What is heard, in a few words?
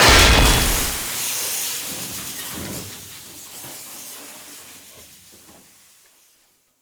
Barrel Impact; Ambience; Gameplay; Effervon; Warframe; Nineteen Ninety Nine